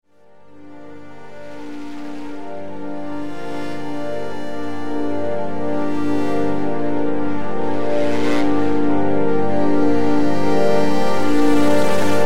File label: loop